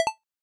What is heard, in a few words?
notification